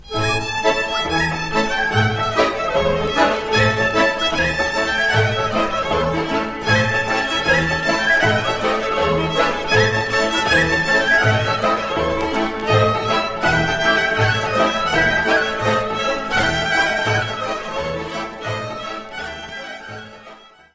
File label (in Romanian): Suita olteneasca - Roumanie